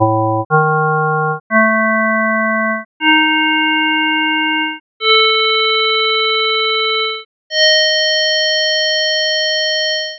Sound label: Add Synth Test; jmusic; jmtutorial